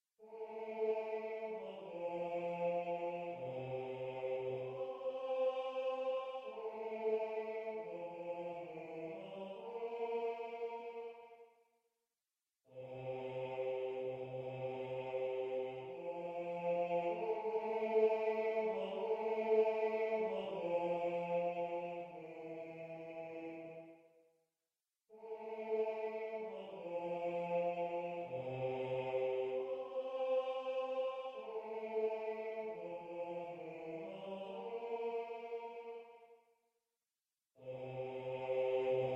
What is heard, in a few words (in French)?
choeur des esclaves basse